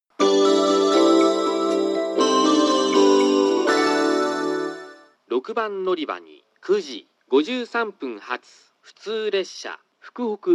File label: local Hakata; kokura